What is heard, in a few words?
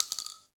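case 12 gauge; projectiles; cases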